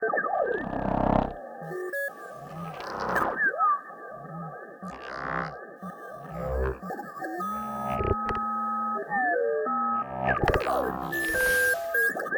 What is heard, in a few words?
roboport working; factorio; Factorio 0.14.21